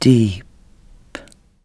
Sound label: Cave